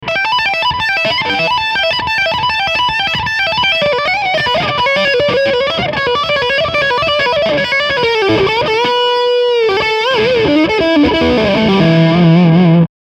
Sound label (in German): Gain FULL Shape 11 Uhr